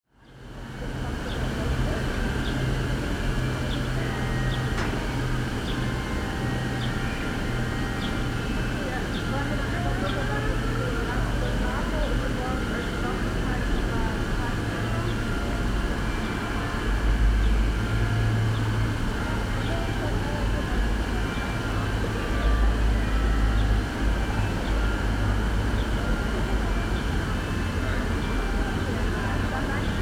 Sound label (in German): Zeitklang, footsteps, voices, and a barking dog shape the scene—the latter activates the acoustics, making reflections on stone surfaces and buildings audible; Soundwalk; A Soundwalk for Klangspuren Festival 2025